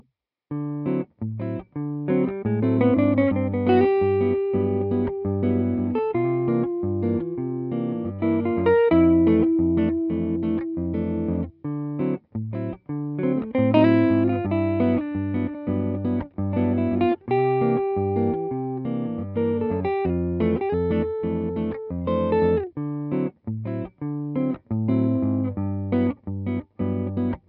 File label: Jazz Deluxe; ReTube Custom Shop